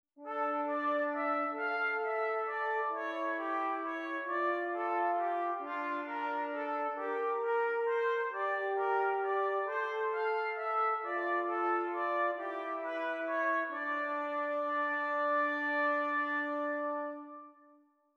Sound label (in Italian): Esercizi di contrappunto a due voci; Zwete Gattung; Ronge pagina 65; Correzioni